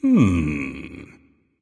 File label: thinking; smite